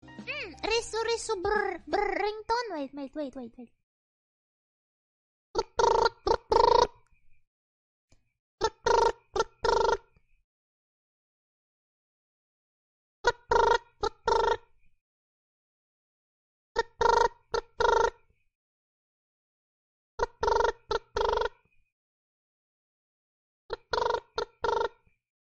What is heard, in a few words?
brrr brrr brrr